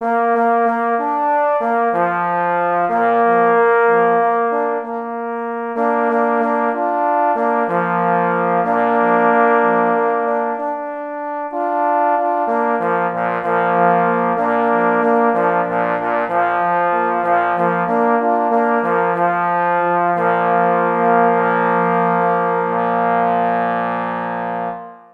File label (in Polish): Hejnal na 3 parforsy; K.E. Kroskowski; Hejnal KL Slonka w Sierakowicach